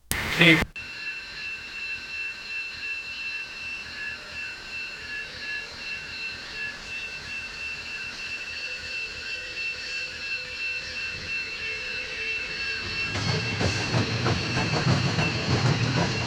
a historically accurate, trains

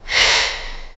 breathing

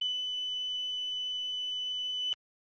timer

